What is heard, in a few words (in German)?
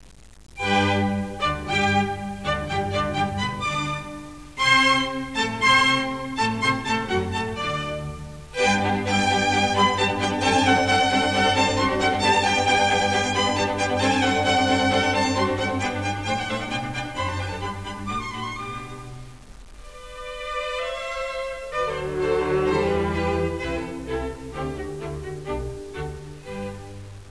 Nachtmusik